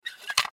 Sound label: Cassette Tape Case, Cassette Tape Case Open Squeak Plastic; Tape Players